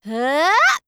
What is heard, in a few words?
huuuuup